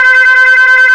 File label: FUZZOR C5; GLUNGE